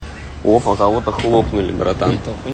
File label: kill knife; cstrike; ctf